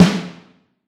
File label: CAH, vintage snare drum; Q Up Arts; Johnny.C's Vintage Snare Drum